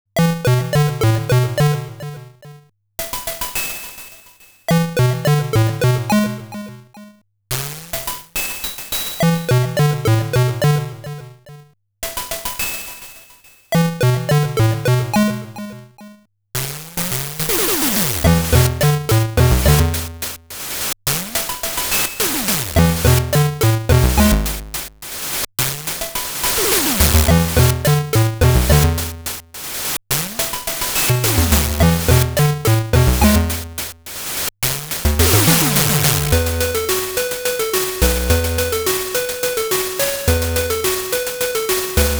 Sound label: 8bit